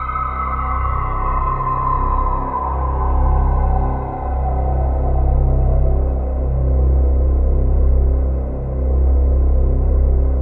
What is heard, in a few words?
ATMOPAD; Best Service; XX Large; Pads